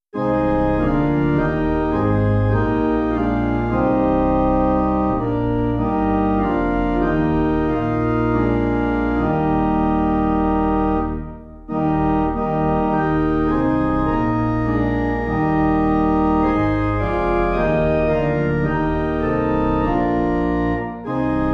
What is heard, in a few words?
Pipe; LordThyWord; Ravenshaw